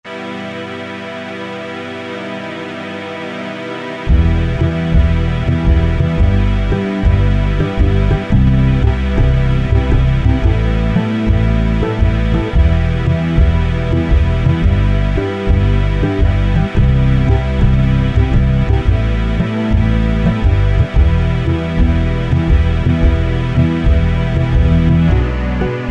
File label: E-mu Morpheus